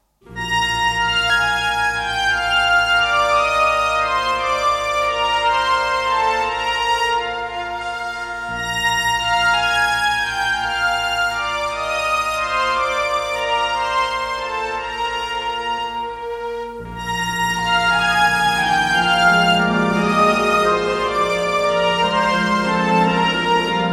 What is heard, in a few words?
Solo Dance